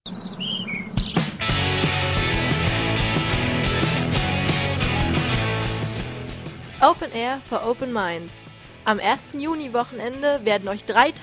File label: Jingle